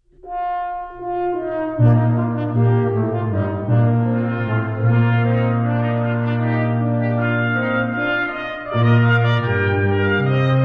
Brass Quintet; Canzona per Sonare No. 4

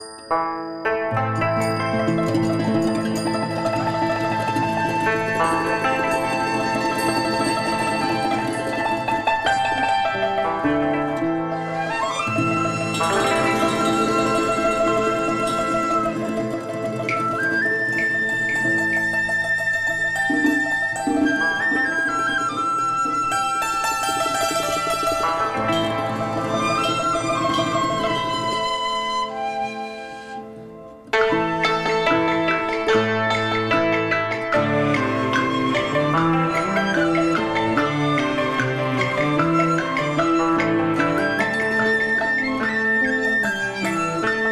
TRADITIONAL Chinese, Picking Flowers

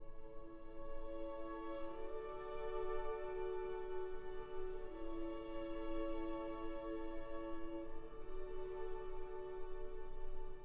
for cello and strings

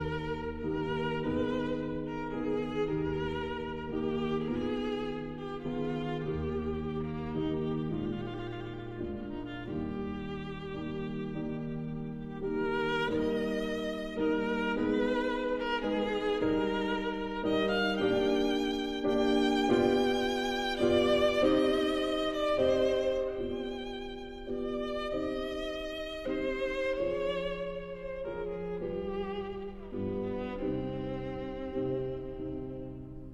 Sicilienne for violin and piano

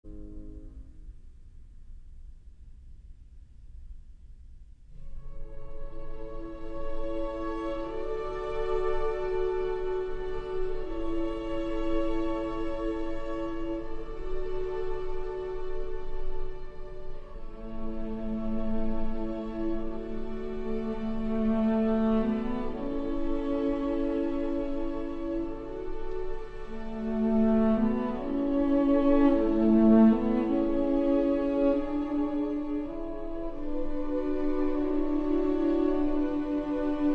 for cello and strings; Archi di Santa Cecilia

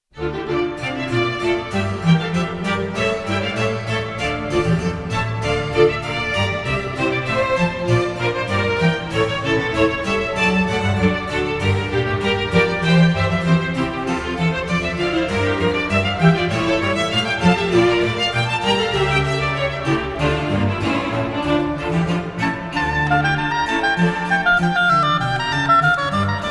Oboe Concerto in D minor; Bach, Johann Sebastian; BWV 1059

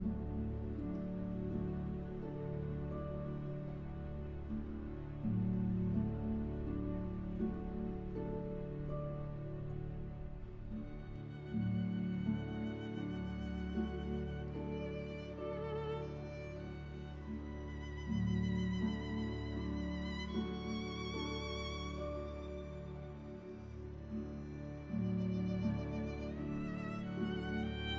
Meditation for violin and orchestra; Thais

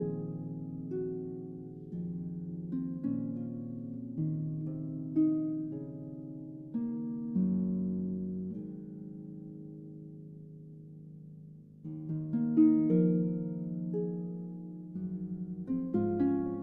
Harp Concerto Lullaby

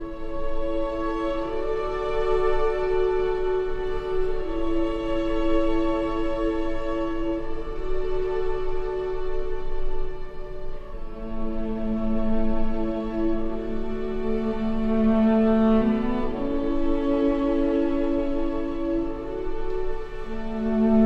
for cello and strings; Archi di Santa Cecilia

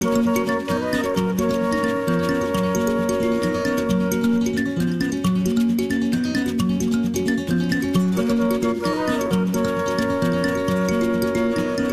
Traditional (Luganda)

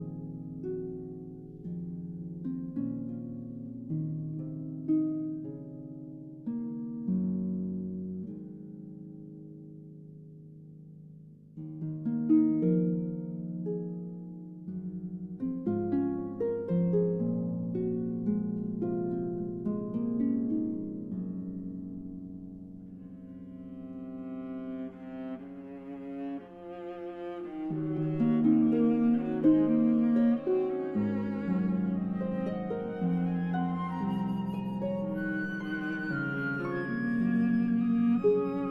Harp Concerto Lullaby

